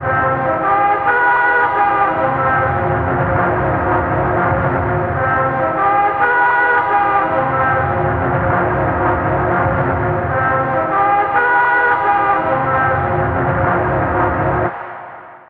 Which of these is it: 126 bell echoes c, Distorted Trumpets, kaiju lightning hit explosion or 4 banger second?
Distorted Trumpets